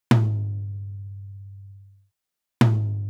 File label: Pearl Tom; hydrogen